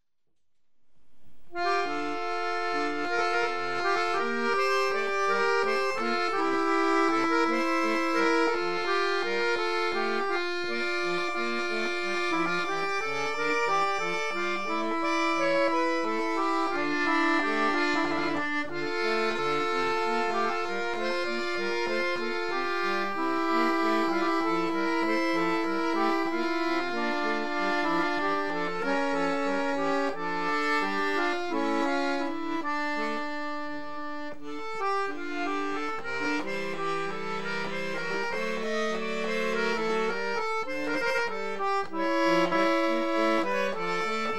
menuet

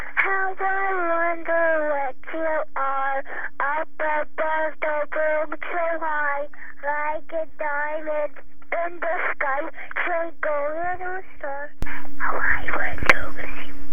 twinkle

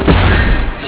laser gun

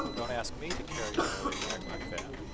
noisy; 5dB

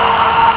car horn

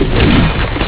laser gun

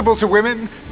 sample, male laugh

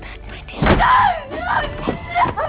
female laugh

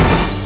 close door

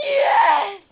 sample, male laugh

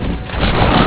laser gun

